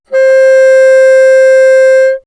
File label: Bassoon ff C5; dsp2008 sound library